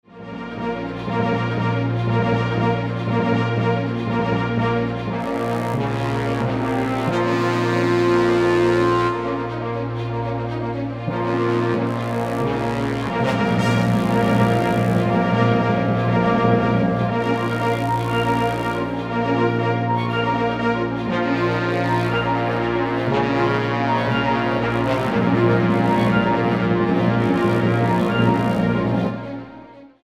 Battle

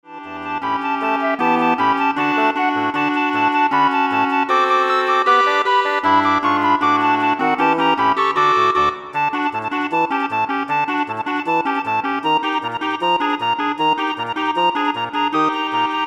Woodwind Ensemble

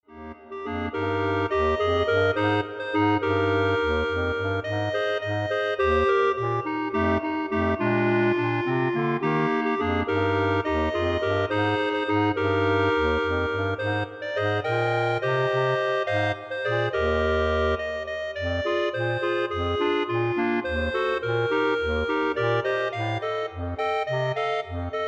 Clarinet Ensemble

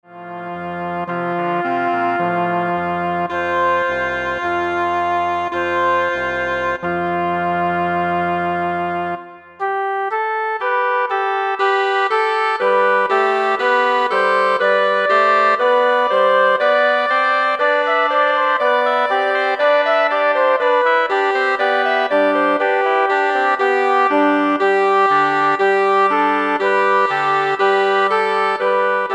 Woodwind Ensemble

